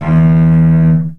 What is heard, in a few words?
short strum, cello